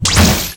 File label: FAST SHOT